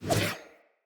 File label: Sword Whoosh L to R; Weapons; Player; Swords; Fey Sword